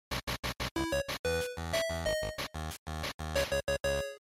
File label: GB Fighting Start